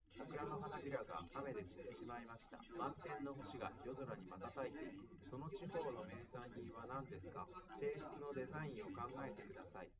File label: male live rec 1m sim; SASSEC07